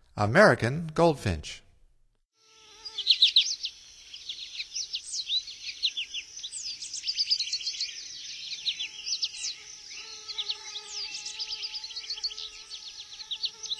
American Goldfinch, Songs of Birds of North America; Stokes